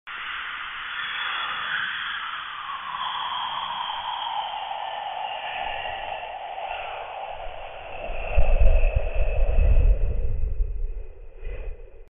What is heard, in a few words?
Airplane Flying By